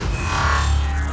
Robotic Vibration

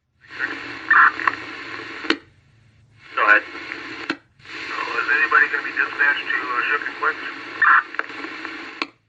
Police Scan

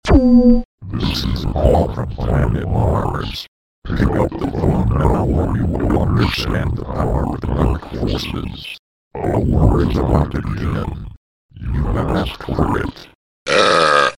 Alien

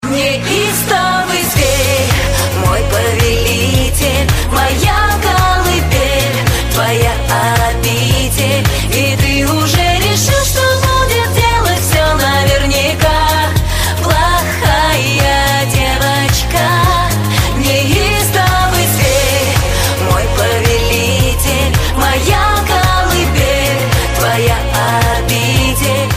Russian Ringtone

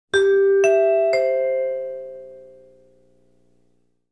Chimes Fast; Nbc

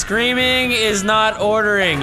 Screaming

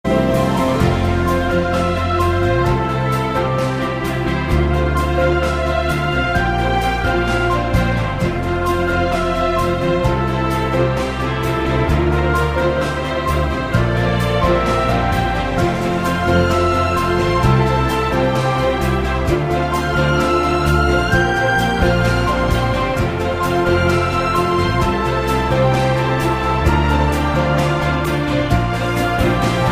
Samsung Ringtone

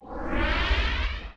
Teleport; Halo

